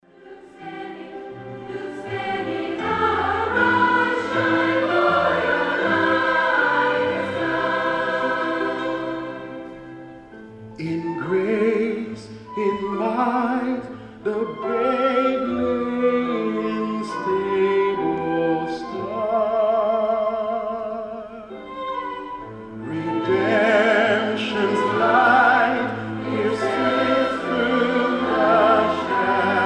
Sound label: Solo Choir Orch